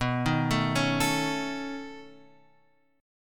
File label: B 9th B down Guitar Open F; B9 arpeggiated; JGuitar